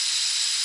Zip Line Wire Loop; SFX Kit

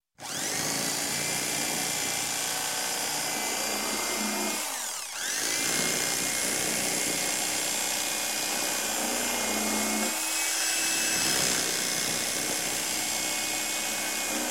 Knife, Electric Cutting, Food; Sound Ideas 2000 Series

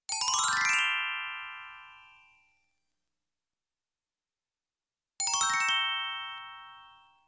Ascending Gliss (glockenspiel), Cartoon; Hollywood Edge; Cartoon Trax

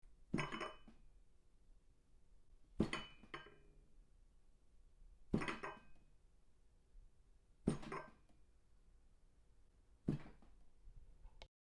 coffee table bump; blindside

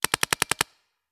Paintball Gun; Sound Ideas; Guns

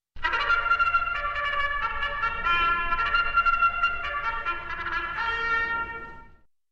Bugle Call To Race Music; Sound Ideas; Series 2000

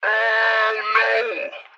hit yell; Blind Drive

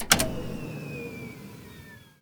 Computer Powerdown Mac; SFX Kit; Foley - Misc

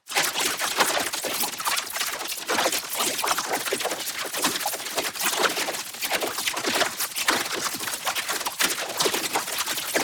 ABILITY CHARGE Liquid Metal; boom library; Matter

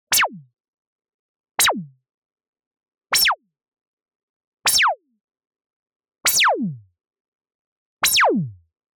LASER Various Lengths Double Filter; Anime Essentials

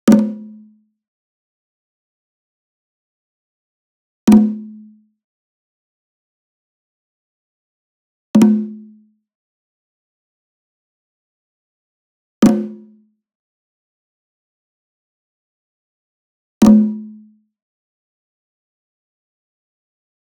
SMALL DRUM Tambora Flam Hard Beater Near; boom library; Cinematic Strikes Construction Kit

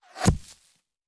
Bullet Impact; Sound Ideas; Guns

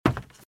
Footsteps - Wood - Creaky - Run - Right; RadwinIsland

